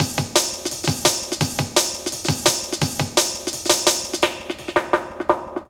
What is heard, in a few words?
LOOP, Jungle